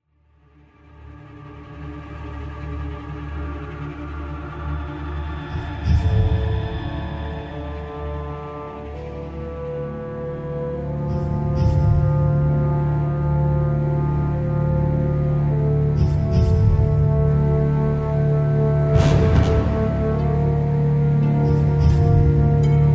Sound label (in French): SERIE TENSION HOSTILE ENVIRONNEMENT